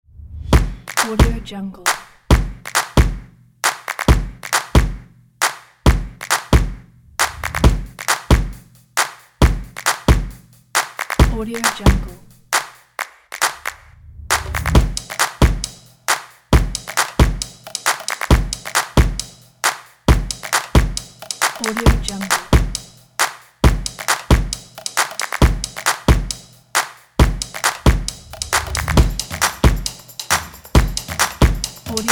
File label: percussion